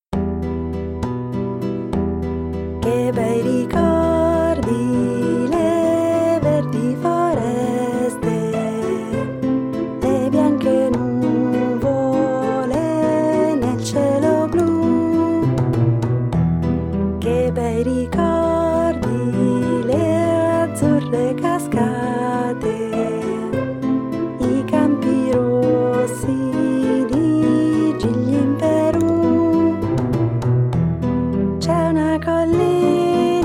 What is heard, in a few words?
Vals Peruviano